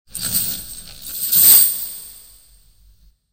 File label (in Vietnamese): Tieng tu nhan bi xieng xich